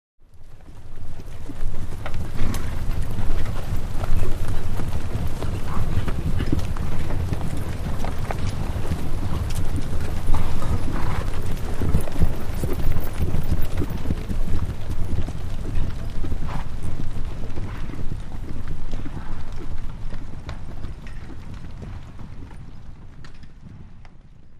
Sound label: 100 Horses Trot By Gro